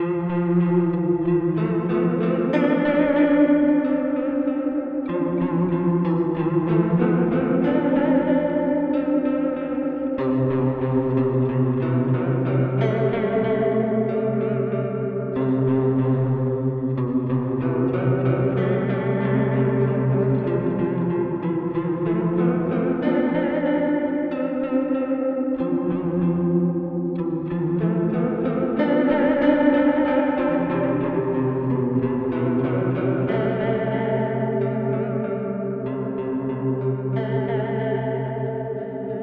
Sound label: Delay Reverb Modulation; UAFX